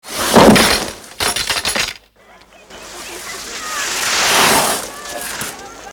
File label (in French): Oh merde boum + arret ski